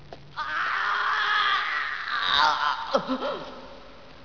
agony, japanese

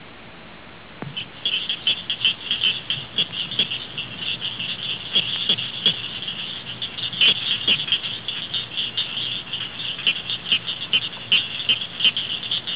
cricket frog

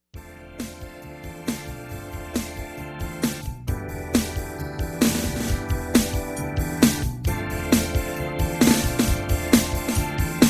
Circus

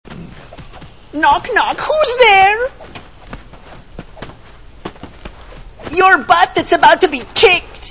knock knock

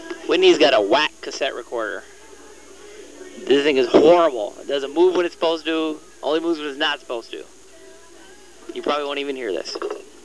recorder

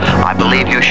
program error

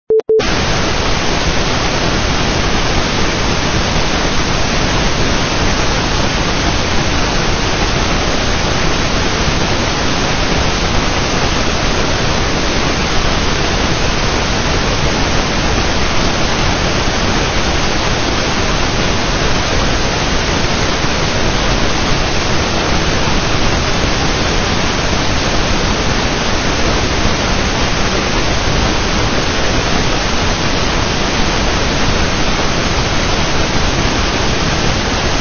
pink noise

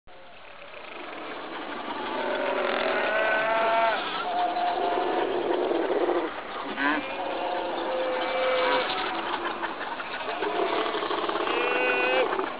seal, alaska; shoals

